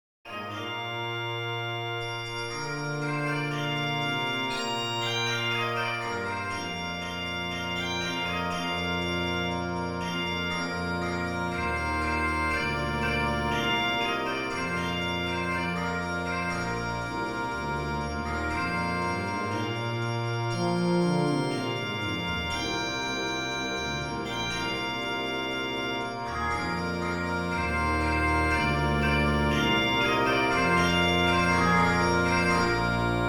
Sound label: cartoon music